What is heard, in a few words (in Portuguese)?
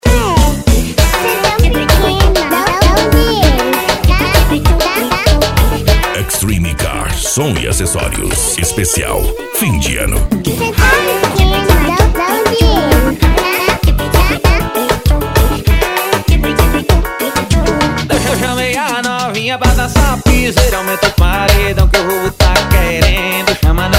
piseiro e sertanejo